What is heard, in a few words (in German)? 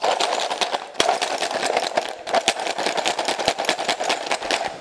Stiftebox schütteln